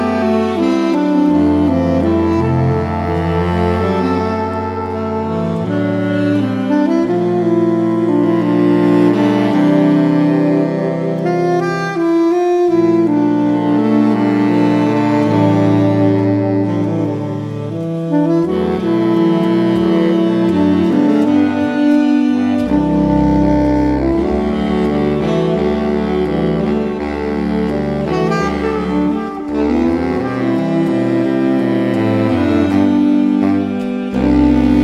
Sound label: Saxophone Quartet